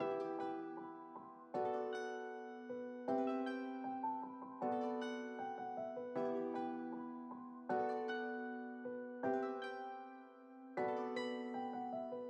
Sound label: Type Beat Melody Example